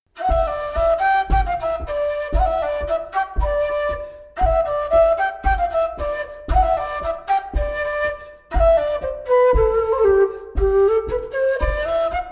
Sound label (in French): Bourree parisienne bourree